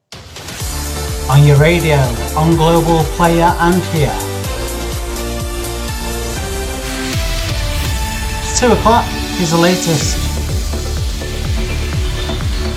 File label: News Bed Intro; Heart